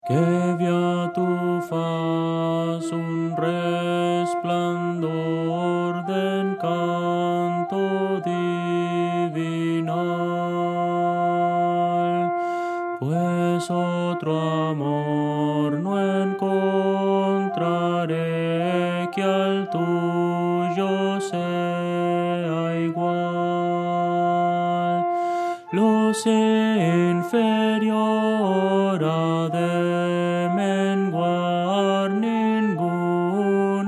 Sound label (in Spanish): contralto; que vea tu faz